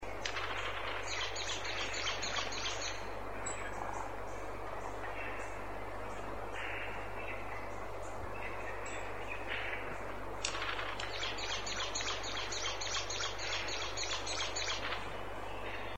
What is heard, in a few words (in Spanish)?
Picaflor Común, Chlorosttilbon aureoventris, Glittering-bellied Emerald; cantos